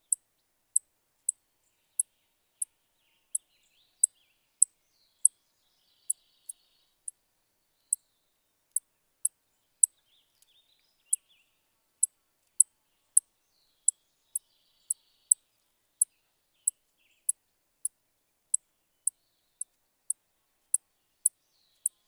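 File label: sounds of common savannah sparrow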